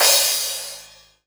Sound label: Cymbals Crash; Vengeance Samples